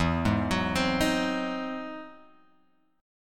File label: E Augmented Major 9th E down Guitar Open F; arpeggiated